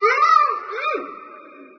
town muffled cry; ambient; creatures; source engine; hl2; video games

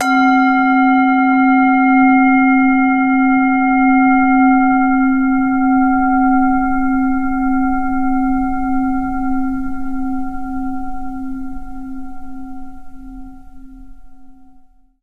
zen prayer bowl; Source Engine; tf; japan fundraiser

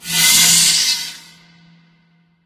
dropper iris open; Source Engine; Portal 2

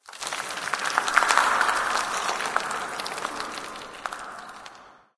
debris random; ambient; Left 4 Dead 2; Source Engine; random amb sfx

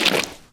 paint, footsteps

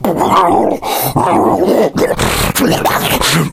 shoved long, npc infected; Left 4 Dead 2; Source Engine

